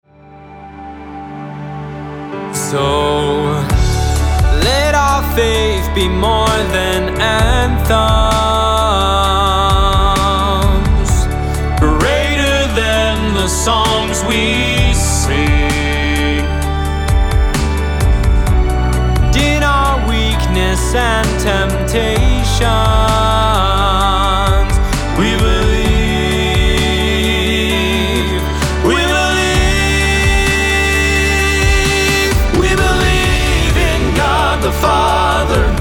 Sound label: gospel quartet